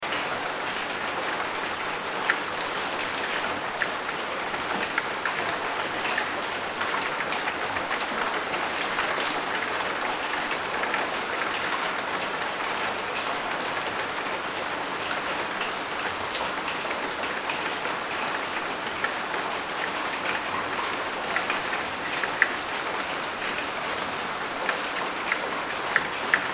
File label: rain sound loop; butterflysoup2